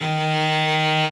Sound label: cello